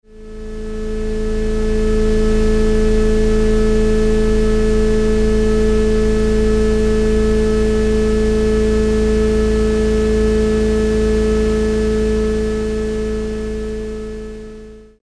432 Hz Tonal Frequencies and Noise Resonance Ambient; 432hz tones and noise ambience